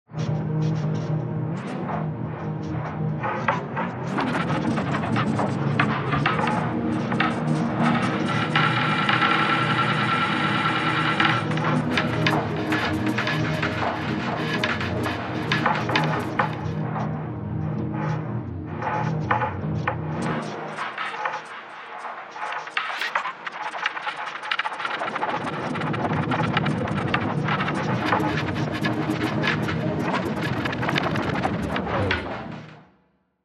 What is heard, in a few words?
Granular Soundscape; klangsynthese